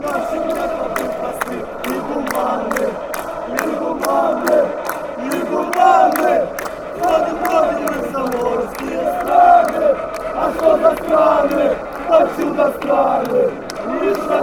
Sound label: chant, fanchants, metalist